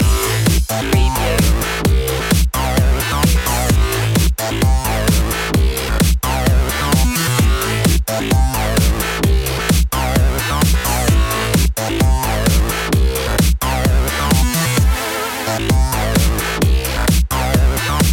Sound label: flying chords loop